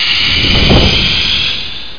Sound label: HYDRO; Duke Nukem 3D Kill-A-Ton Collection